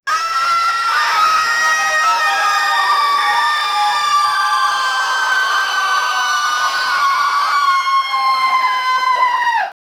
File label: crowd scream horror game; chaos